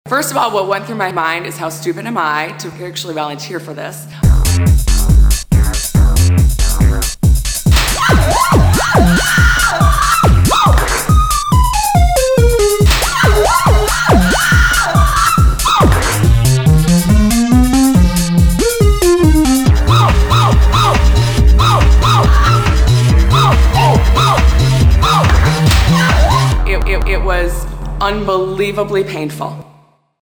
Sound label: Zap Dance